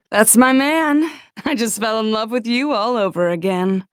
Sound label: aww; nine